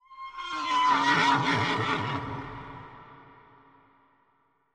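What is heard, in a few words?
Animal Stinger Eerie; stingers & whooshes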